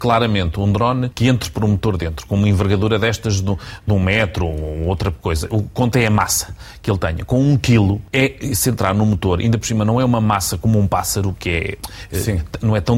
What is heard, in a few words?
Drone